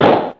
Clap, Ensoniq ASR-X Pro

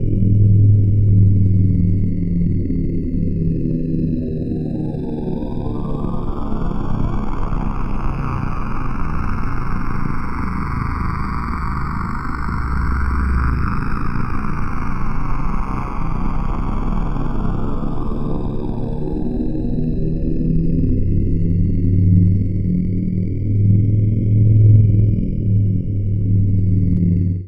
Atmos C1, Club Techno; Zero-G

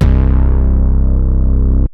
Synths BASS

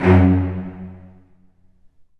Cel Spc F#1; Digital Sound Factory Virtuoso

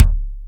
DR-660 Kick, Boss DR-660

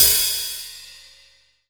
JAZY HH, JAZZ DR

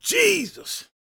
GOSPEL MALE; Spectrasonics Vocal Planet

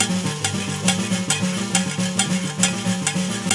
HARP SHAKE, HARP+SHAKER; Zero G - Ethnic

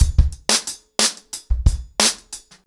ACOUSTIC DRUMS, 90 BPM

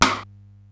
SDS9 RM, Simmons SDS-9, Rimshots

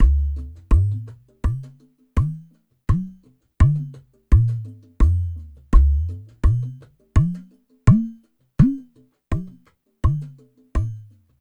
WAVEDRUM; Spectrasonics

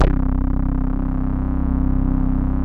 CS-15, BASSES

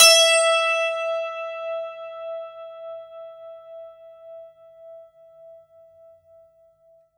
STR, SANTOOR; Best Service ProSamples vol.52 - World Instruments 2; AKAI

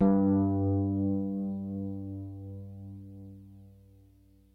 DYNO RHODES, E-PIANOS; Kid Nepro - Elite

